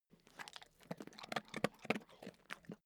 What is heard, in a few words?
stafford eat sniff